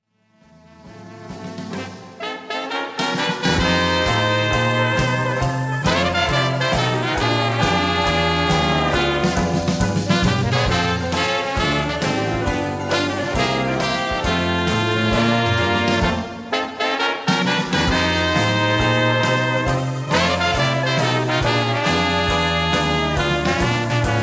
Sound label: Melancholy Blues